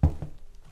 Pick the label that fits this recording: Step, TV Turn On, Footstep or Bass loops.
Step